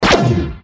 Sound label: fire; gmod; lvs star wars; vwing